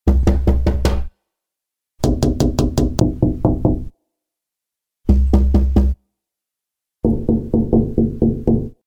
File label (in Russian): vokrug doma; Звук стука в дверь для сказки; Для детей, для детских постановок